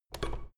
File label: door plastic handle pull down creak